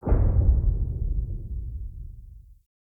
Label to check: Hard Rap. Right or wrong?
Wrong